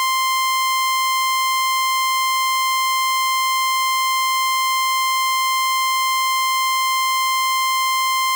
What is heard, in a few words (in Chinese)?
Moog 55 C5的锯齿波; 硬件产品; 没有混淆现象